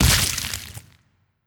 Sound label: Pistol Launch; Pistol Hero Head Launch